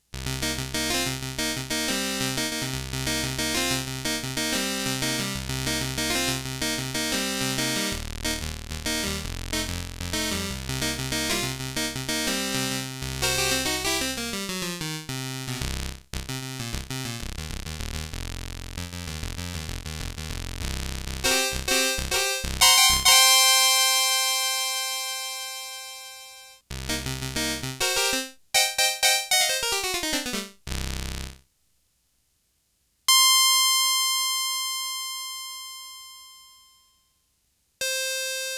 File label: Low Clavichord, Multivox MX-20